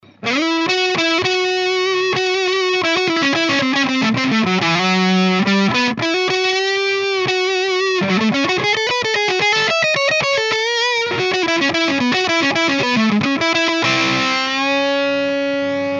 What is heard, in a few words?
Distortion Neck Humbucker Solo